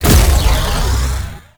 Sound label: Infested Lich Mindhack Cast; Warframe; Abilities; Zeke